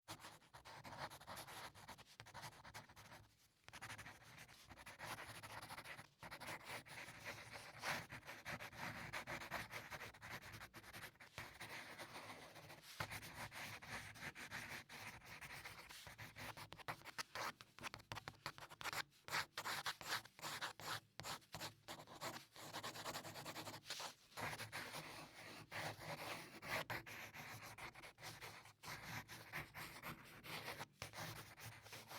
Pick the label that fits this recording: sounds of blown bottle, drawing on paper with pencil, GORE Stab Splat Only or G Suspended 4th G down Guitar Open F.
drawing on paper with pencil